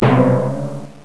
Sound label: BOING; Widget Workshop; A Mad Scientist's Laboratory; Maxis